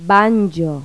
BANJO; Pipo-Aprende musica con Pipo

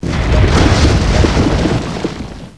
collapse; NETSTORM

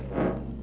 menu popup; kong

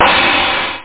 RIDE CYMBAL; percussion

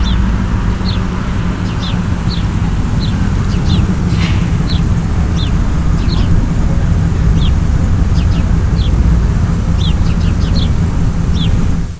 City Birds Light; The Ultimate Interactive Guide To Marijuana

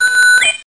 bite warn; FishSim

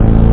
bike sound; Golden Games; Arena

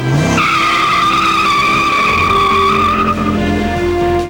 peelout; The Corvette Anthology

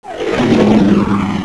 Driveby; HAVOC; RB Sounds

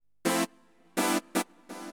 SYNTH HOUSE 125 A; eJay; Keys

